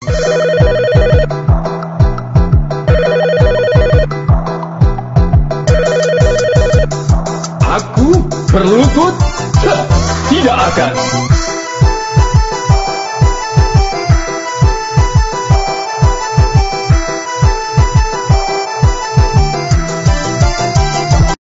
Office Telephone Music

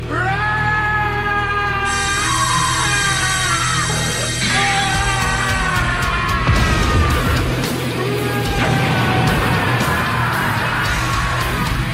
Explodes; Broly

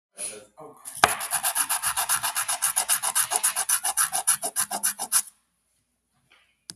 Sand Paper

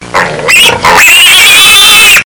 Pig Grunting and Squealing

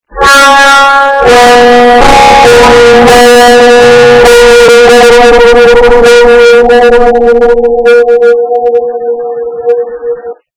Horror Sound; Roblox

